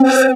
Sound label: Synths Root C